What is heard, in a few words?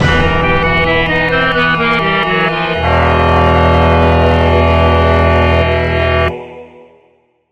Death